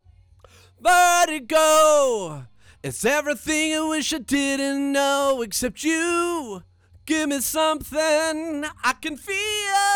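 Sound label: vocal; Pro Tools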